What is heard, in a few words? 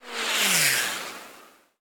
bullet passby